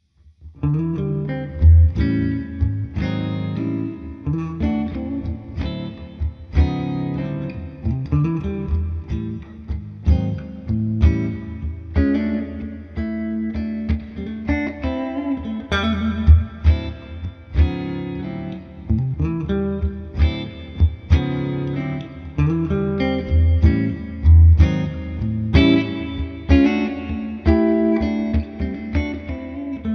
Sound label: clean, humbucker; Neck/Bridge 2